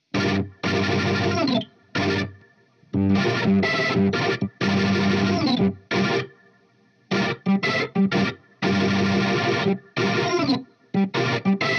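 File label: amp organ, Hybrid neural guitar overdrive; souldriven; Morphdrive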